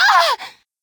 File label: Short Scream; Lewd SFX